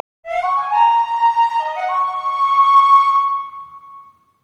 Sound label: tidalwave flute; nami koi